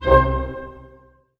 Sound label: STAC C PP, orch stac; Sound On Sound - Peter Siedleczek's Orchestra